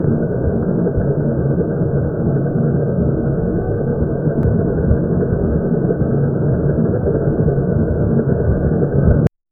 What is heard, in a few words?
the sound of the tracks, tank